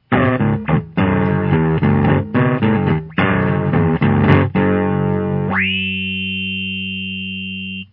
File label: bass ring